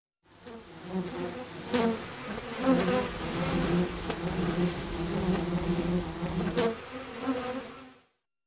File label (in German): insekten